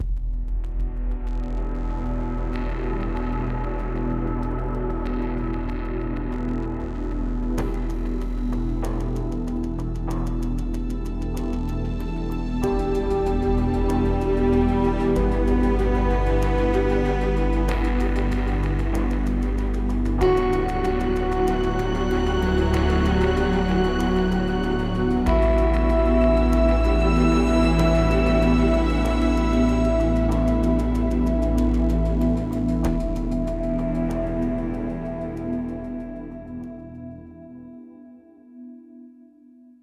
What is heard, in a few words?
Music Post Race 95bpm; Forza Motorsport 6 Apex